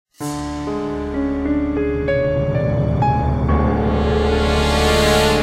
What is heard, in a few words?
Fight Intro; music; The Da Vinci Code; XBOX